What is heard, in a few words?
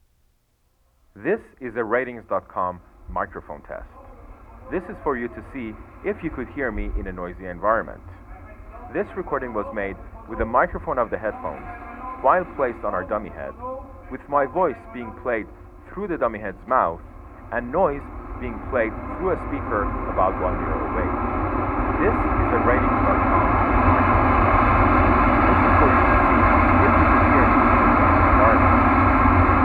in lin mic subway